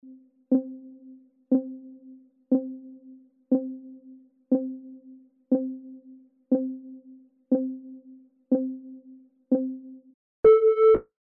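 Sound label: clock ticking 10; Тиканье часов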